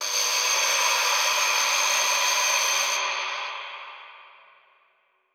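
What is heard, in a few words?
alarm siren, echo processed, 170bpm; musicradar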